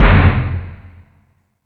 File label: FX Sounds, Drum Machines E-MU Pro-Cussion